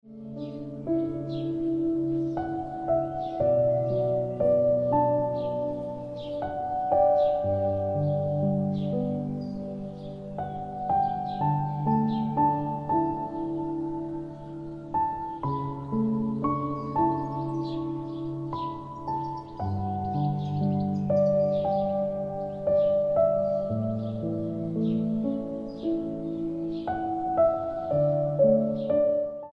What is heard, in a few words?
Ringtone Piano Music